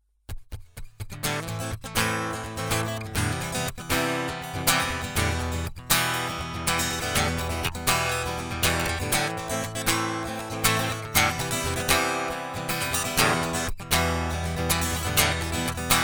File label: QStrip On A Git; Tech 21